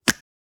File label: Whiteboard marker office stationery open